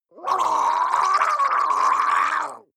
water monster female gargle scream hard, comedy cartoon; soundpool